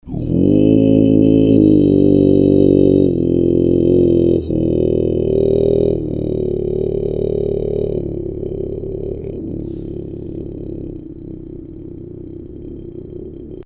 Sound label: low, khoomei